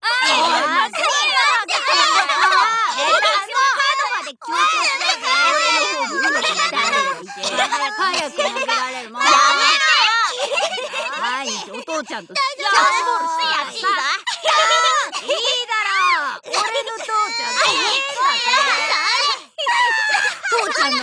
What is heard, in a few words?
Mob Children; 24-ji no Kane to Cinderella Halloween Wedding; PSP